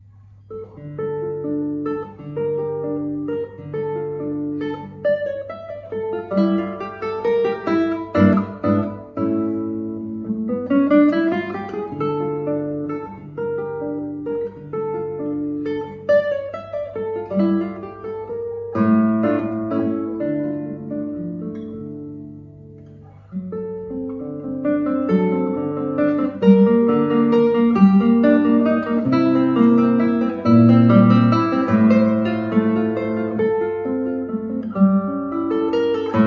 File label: Etude in D minor; Etude No. 24; Napoleon Coste